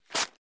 Paper Tear Crunch; paper mvmt

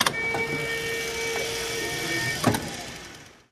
Elect Wheelchair, wheelchair

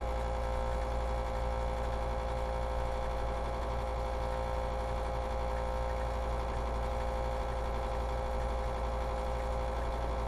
Ambiance Machine

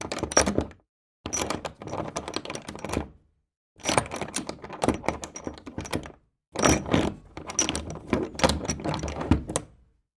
Padlock Metal Hooks

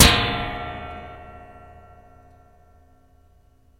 Aluminum Sheet Struck, Suspended

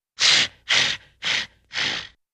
Fiendish Dog Pant

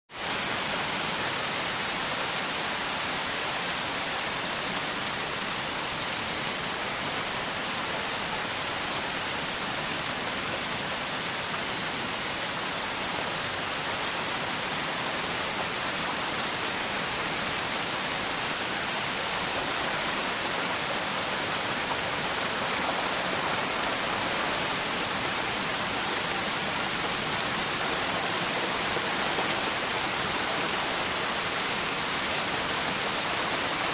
Rain Very Heavy Down, heavy

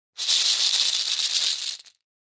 Cabassa Shekere Shrt, percussion shaker

